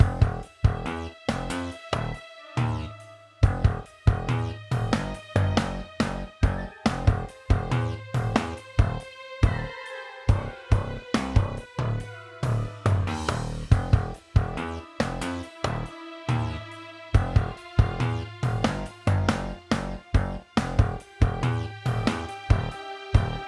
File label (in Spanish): music mazmorras; Lootlite; Xbox Series X/S